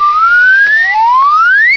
SLIDE UP; EFFECTS

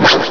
WHOOSH; MOVEMENT

